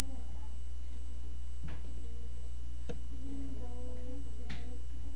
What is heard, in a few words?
fire